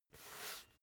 basketball turn swipe long; sport freizeit

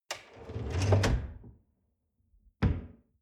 folding door close

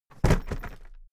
briefcase plastic drop heavy bouncing; industrie wirtschaft; bueroarbeit

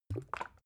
bottle syrup upend soft

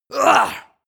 male fight intense; schreie; menschen; soundpool

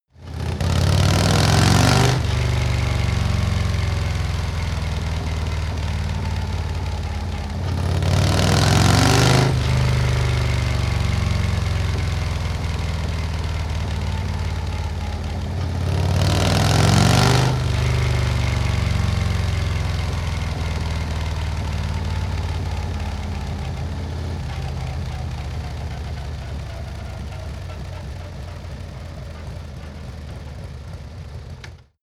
motorcycle harley accelerate triple hard; motorraeder; verkehr transport

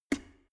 plastic water boiler open lid; maschinen; wasserkocher

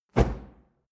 fire torch impact; swooshes-swishes

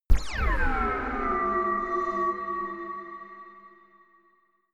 low laser blast shot; soundpool

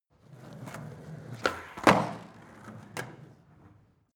skateboard indoors drive kick away

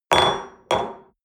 espresso upset table hard, restaurant cafe

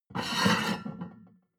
plate on plate take slide medium

